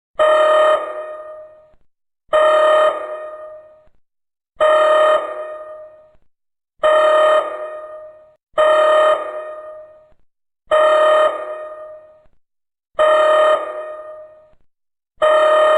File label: alert; Star Trek